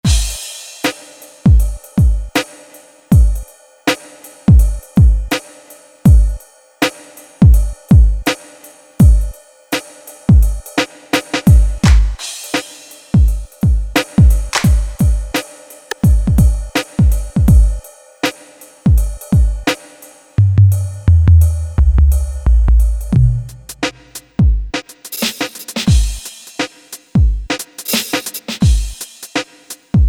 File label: Drum Kit HIPHOP; Generalmusic Equinox